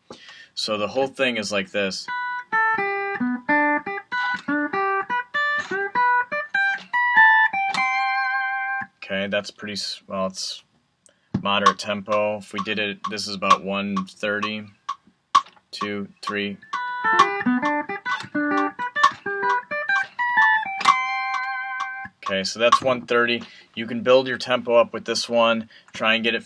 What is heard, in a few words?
fiddle fill 1 to 4 lick